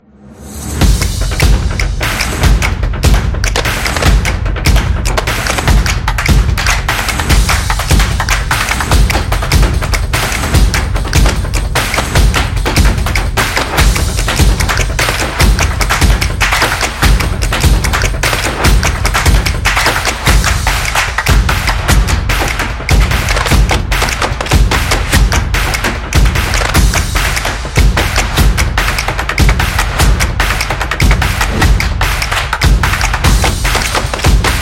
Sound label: The Stomps; sports